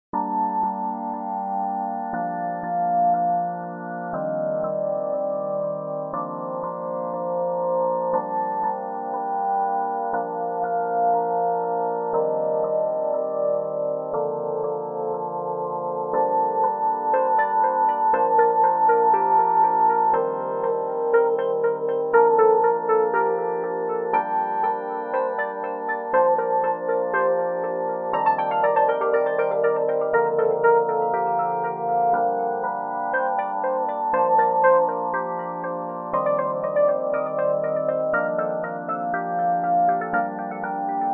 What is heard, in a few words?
pad talk to me